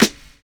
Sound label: SNAP; Univers Sons - Kitch 70s